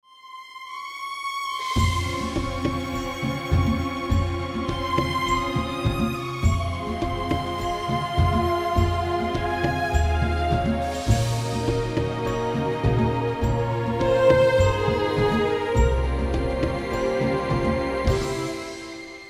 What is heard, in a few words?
Fm orchestral; key - Fm - vocal range - E to Ab